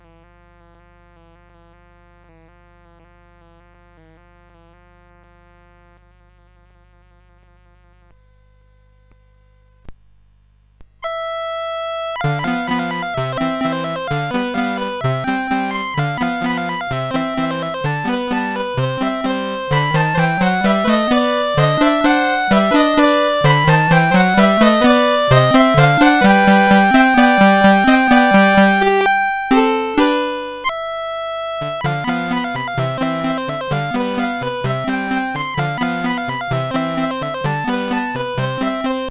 Polka, CSG8580R5; Roth Ladoad

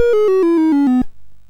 sid MOS6581R4; Cave Climber

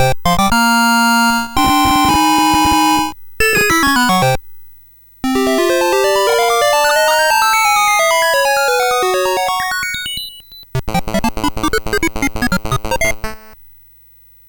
Musicbox, MOS6581R3; hvsc

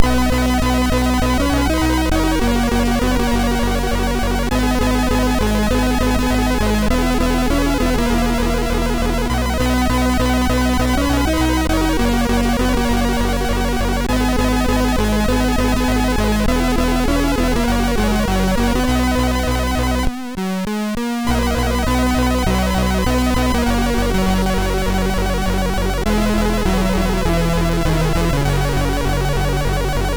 Jumping Jack, MOS6581R2; Andy

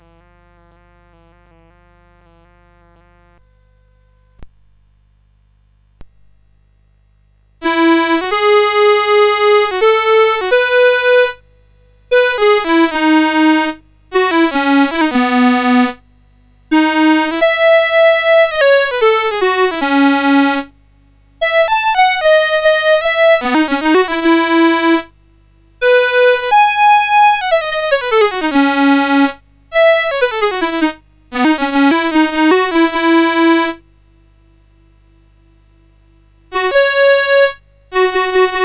Una voca poco fa, CSG8580R5

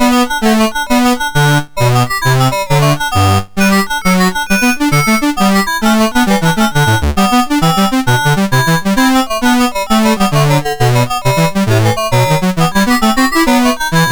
Gigue, MOS6581R2; Sub Roc 3-D

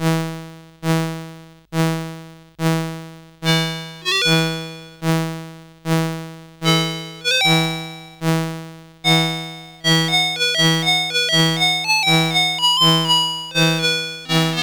sid CSG8580R5

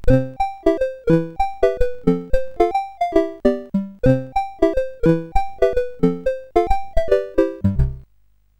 sid, CSG8580R5

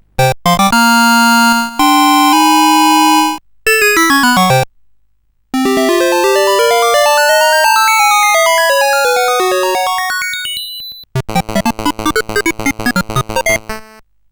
Musicbox, CSG8580R5; hvsc

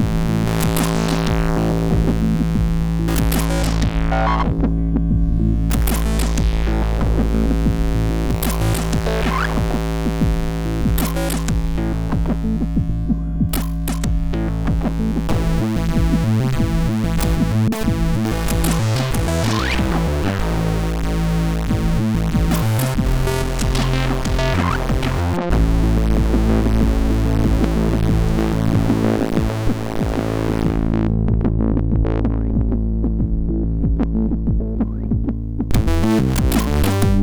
Surrender, MOS 6581 R4

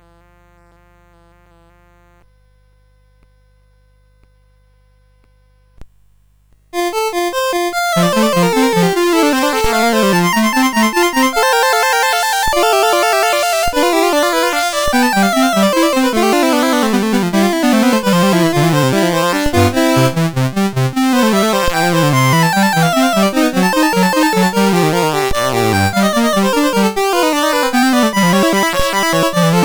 Invention in F, CSG8580R5

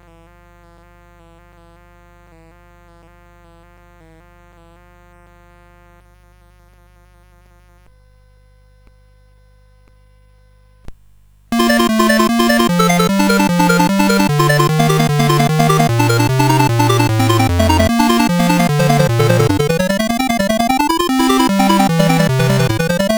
Prelude XXI in B Flat Major, CSG8580; CSG8580R5

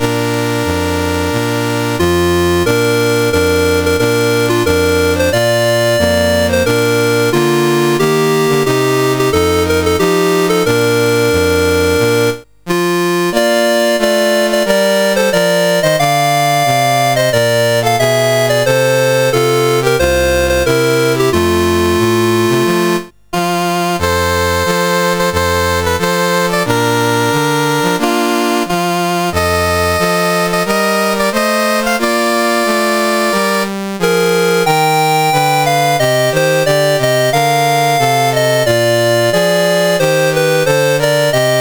CSG8580R5, National Anthems; Malagasy Republic

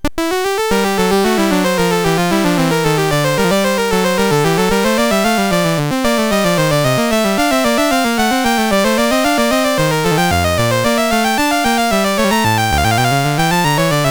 Two Part Invention, MOS6581; MOS6581R3

